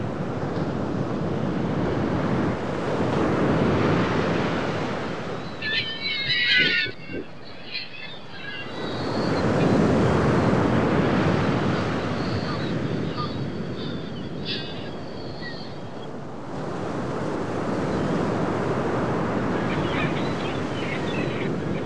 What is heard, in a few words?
seaside